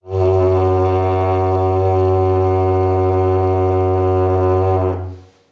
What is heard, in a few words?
ragdung